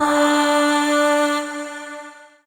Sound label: Void Shawzin Oct Two D; Warframe; Zariman